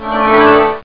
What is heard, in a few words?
Prize Appears; Maelstrom 1.4.0